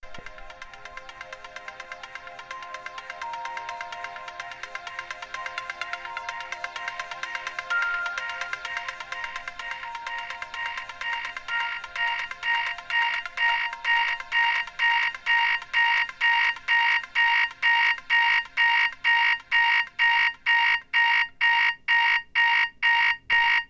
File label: alarm accel